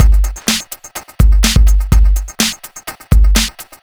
Full, Drum Loops 125bpm, Nu Dance Electro

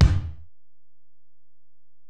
Ac BD, Alesis DM-PRO; 300 Drum Machines

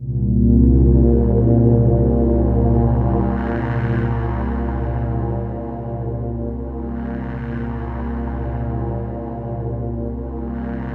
sweep, ambient atmospheres

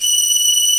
ARCO STRGS M; OMI - Universe of Sounds

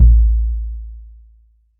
Sub, drum

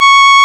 REED C#; Big Fish Audio; Synclav FM

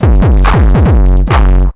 PW 909 140, Hard & Loud Techno; USB Soundscan vol.01; AKAI

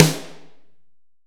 HALL SN, HALL SNARE

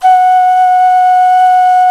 Clay Flute; Roland LCDP11 Africa VOL-1; Afro Pipes

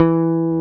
E3, BASSES, Roland

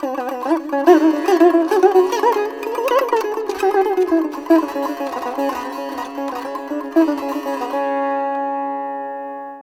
SITAR; Spectrasonics; Heart of Asia

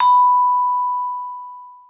VIBE B5, VIBRAPHONE; Greytsounds VOL-1

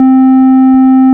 ORG, Farfisa

